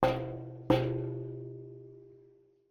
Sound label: Impact, bleachers, knocking, resonant, outdoors, tap, firm; Eiravaein Works; Vaeyan IV